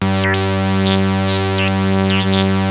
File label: 2600 G3, ARP 2600; Hollywood Edge - Giorgio Moroder Rare Synthesizer Collection